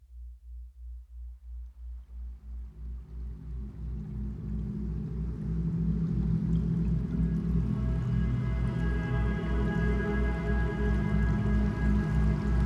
Relaxing SPA Music Sounds of Nature White Noise

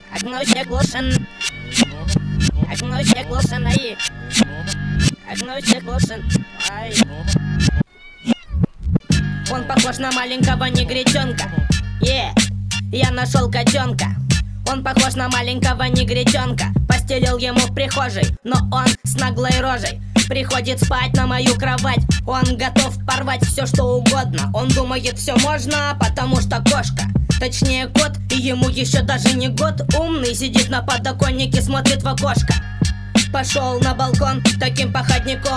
Techno

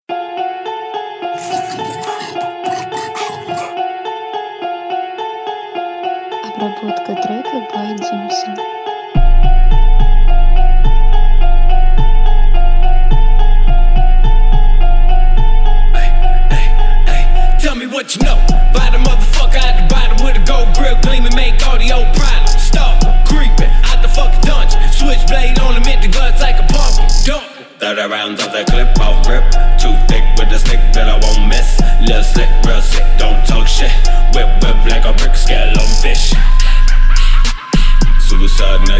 25-28 Hz LowBass

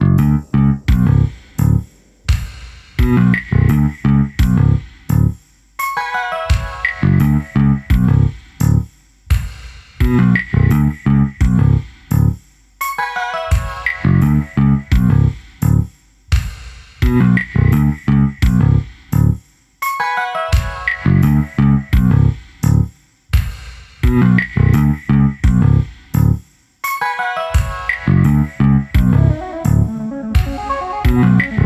Midi Break

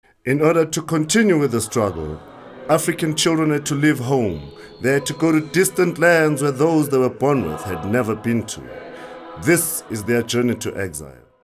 Freedom Choir

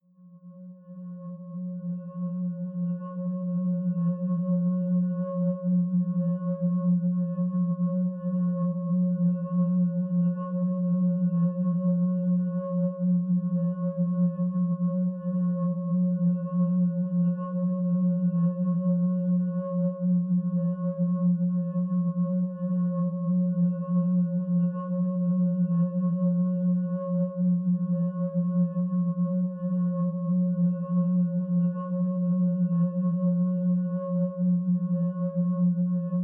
Tibetan Bowl; Tmsoft

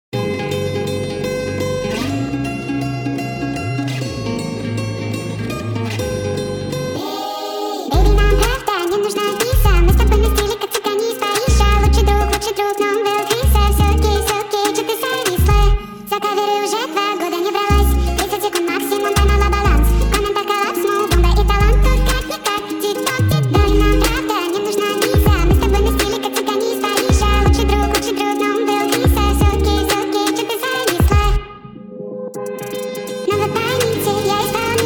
speed up reverb

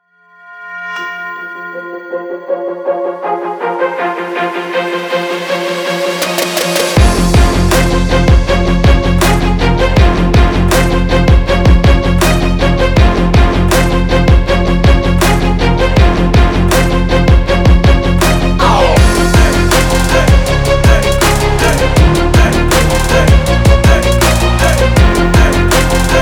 Orchestral Hip Hop

